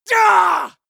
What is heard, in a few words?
male combat hit hard; soundpool